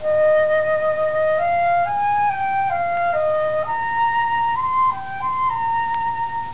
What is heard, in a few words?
flute